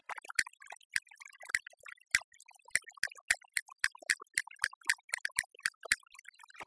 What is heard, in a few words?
Castanets Violin Applause